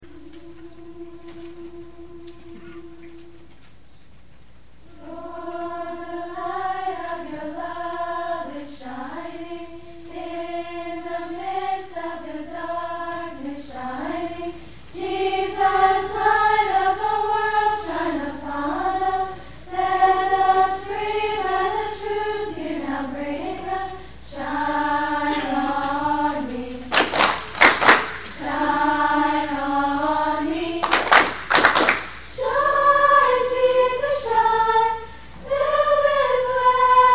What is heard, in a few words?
Girls Choir; Honey Brook Girls Choir